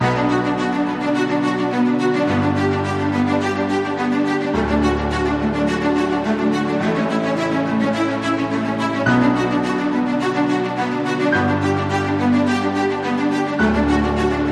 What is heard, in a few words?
Adventure Epic Trailer; Bootflare